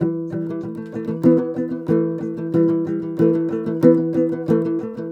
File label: TOPSHUR; Soundscan vol.60; Asian Traditions; AKAI